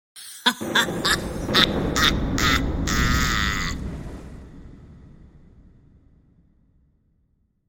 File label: An evil witch laughing with the sound of thunder.; Evil Laughs; Horror